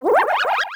Sound effect of Power-Up (Mini Mushroo; NSMB

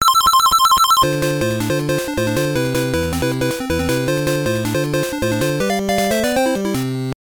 Theme played when getting a 4x chain

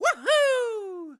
Trio appeal; Mario; SMM

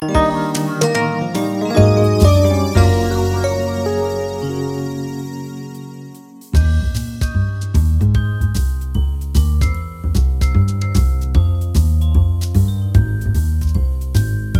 Minigame Over

